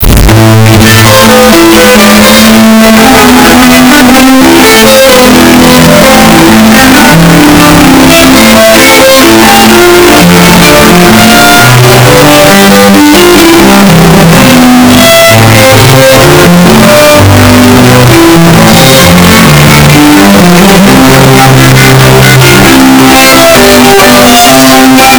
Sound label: Invention in A minor; Johann Sebastian Bach arr. Christian Badzura; Invention no. 13; BWV 784